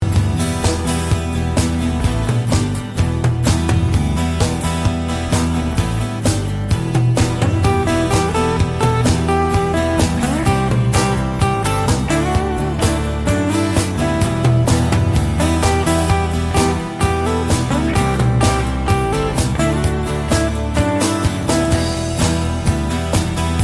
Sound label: most romantic guitar; ringtones